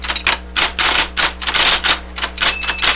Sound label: TYPING